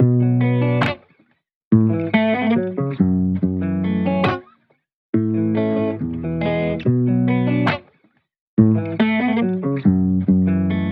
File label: Guitar 70 BPM Key A Major, hip hop rap; cadence